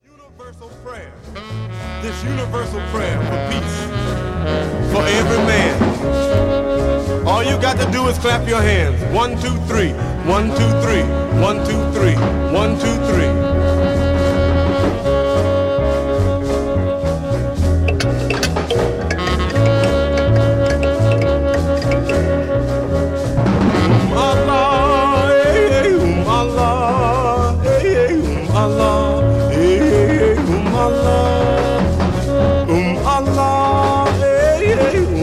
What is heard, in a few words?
spiritual jazz